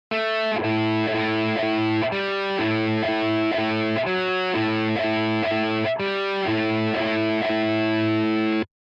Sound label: riff lesson